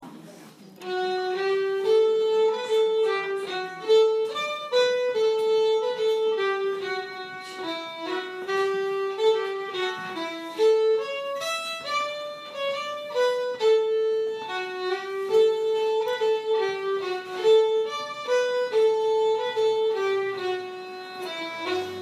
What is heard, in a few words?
Squeezebox; mcQuillans